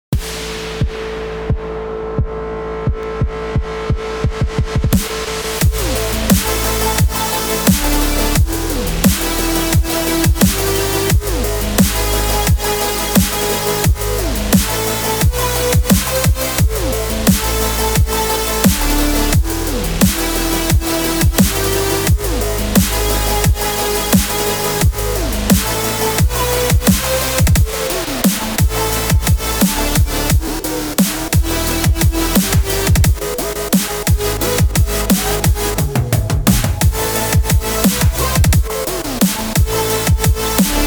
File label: dubstep